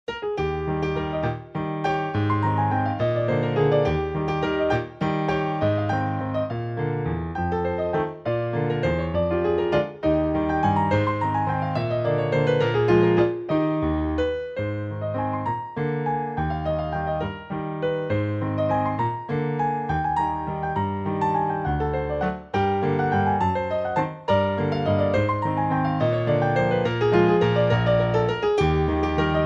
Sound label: Sidewinder Waltz